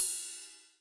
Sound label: Cym Bell Edg; Alesis D4